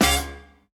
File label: Band Hit; Ed Edd n Eddy; Adromulus Pack